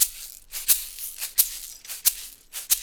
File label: Roots Reggae; Sounds Good - Roots Reggae